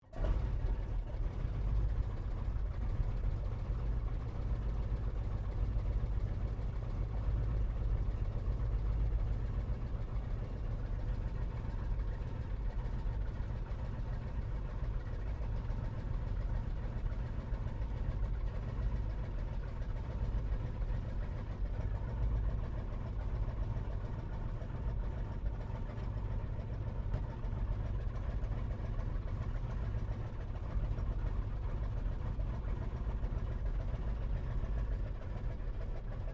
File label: AIRCRAFT 1916 SOPWITH PUP REPLICA STARTUP IDLE INTERIOR STEREO; Airplanes; Replica of a 1916 Sopwith Pup